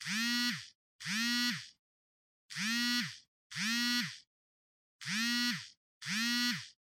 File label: Cell Phone Vibration (Hand)